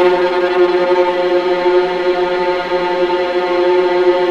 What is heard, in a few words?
VLA E1 TRM R, VLAS TREMOLO